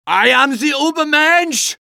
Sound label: cheers; medic